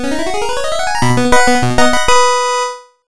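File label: BGM Dungeon Game clear; Dunjon Gameclear; Irodzuki Tingle no Koi no Balloon Trip; NDS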